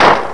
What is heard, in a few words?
magnum firing